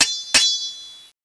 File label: dragon tail idle